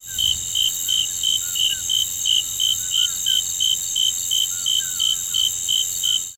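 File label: Crickets Cicadas High Pitch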